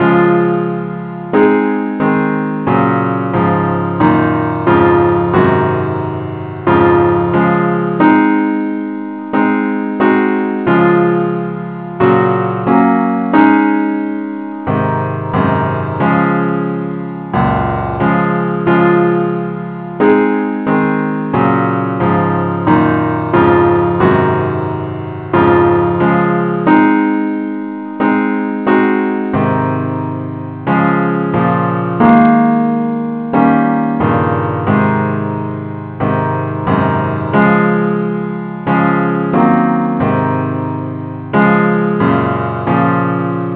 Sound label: gwalchmai advnce Acc key des